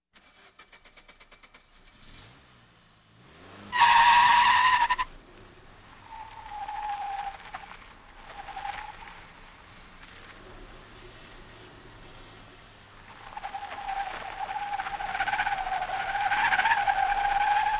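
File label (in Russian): старт машины и аккуратные дрифт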